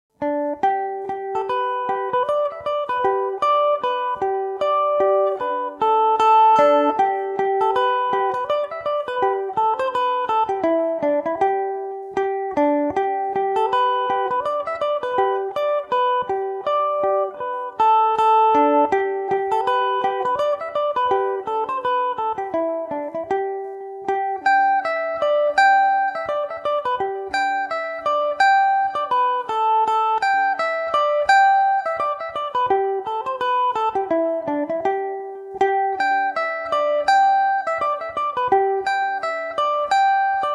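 bill malleys barndance G major revelation, mandolin; Revelation Jaguar electric mandolin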